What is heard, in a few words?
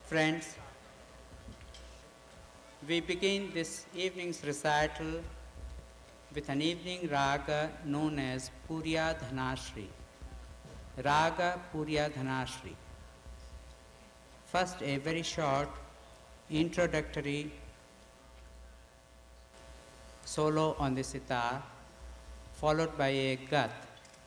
Raga Puriya Dhanashri Gat In Sawarital Live